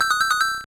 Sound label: Egg; Super Mario Bros. Remastered; Yoshi Egg